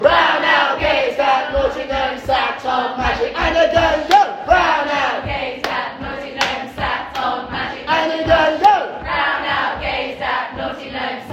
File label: Brown owl